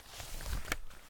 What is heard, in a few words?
Paper Newspaper Light Movement; The Soundcatcher; Paperlife